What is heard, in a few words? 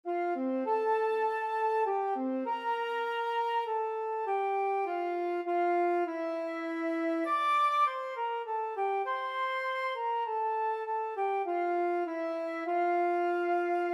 dona nobis pacem, flexible mixed ensemble 3 players